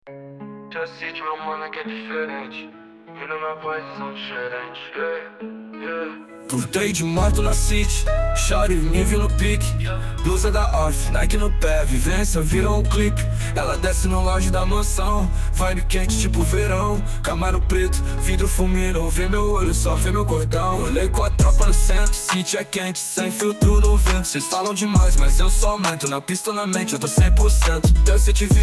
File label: Trap Music